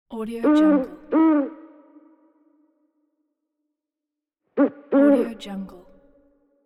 owl

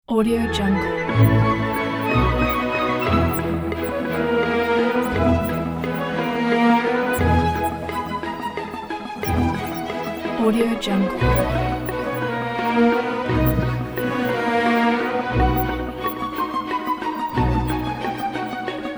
rossini opener ident

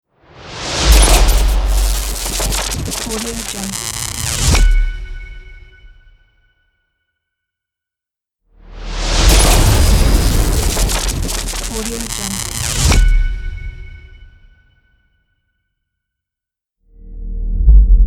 electrical energy logo

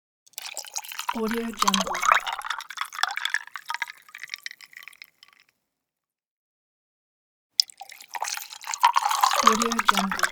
pouring water into glass with ice cubes

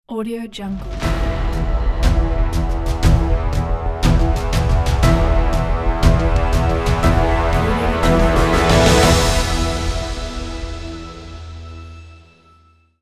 powerful epic logo; soundmake

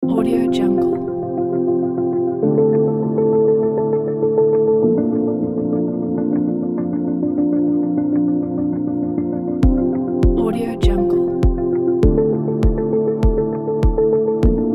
minimal ambient technology

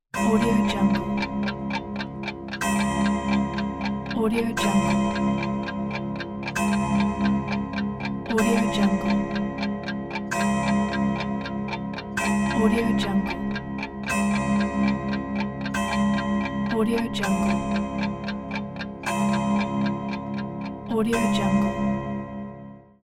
clock bells and ticking

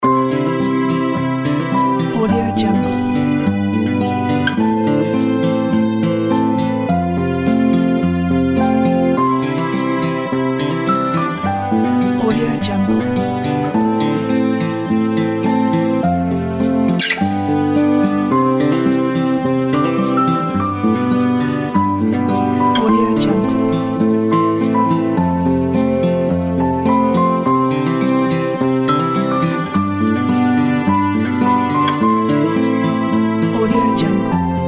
happy relaxed fingerstyle acoustic loop

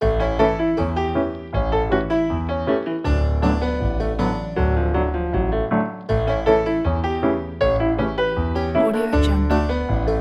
raggamuffin

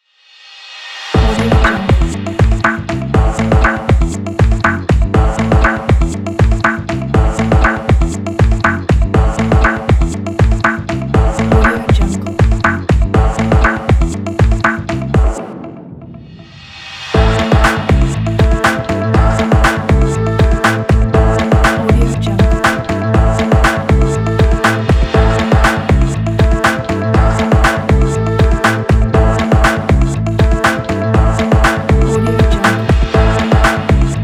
tech kit

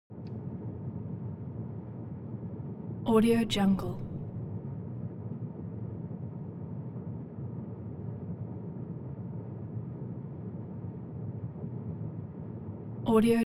transportation sports car int ventilation step

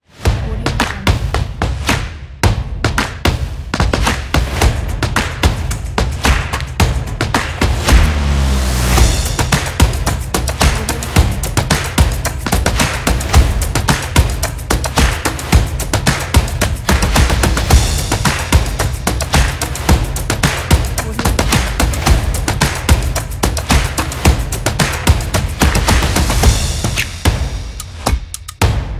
percussion drums

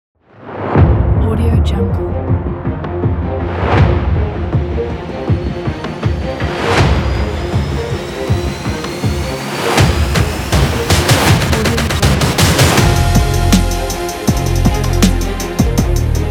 epic hip hop

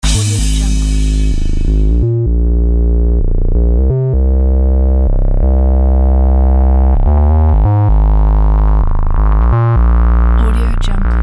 electrix loop

